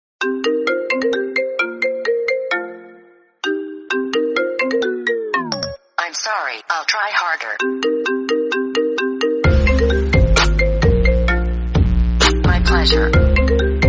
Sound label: trap mix ringtone; iphone